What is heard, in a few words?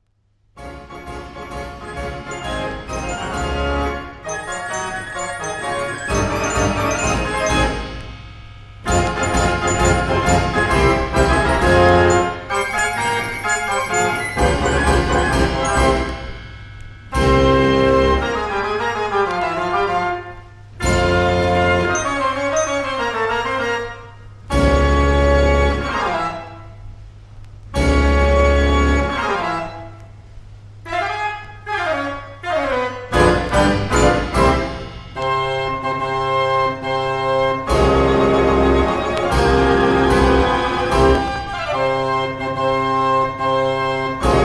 ouverture, Kermis-Concertorgel; De Lange Gavioli; Draaiorgel Radio